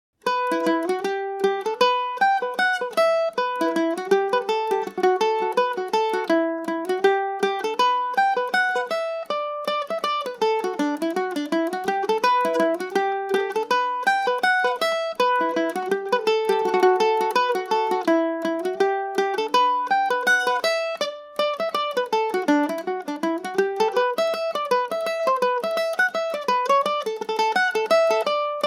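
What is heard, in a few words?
E dorian G and O, irish mandolin; golden keyboard version 2; The Golden Keyboard in the alternative setting; G&O #34 mandolin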